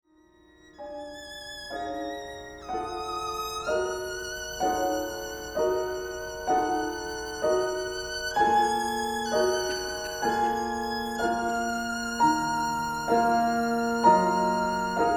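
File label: Sonata for Violin with piano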